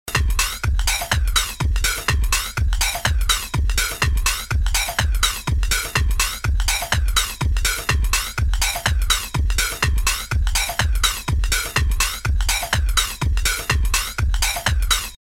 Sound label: Audio Input Loop Flanger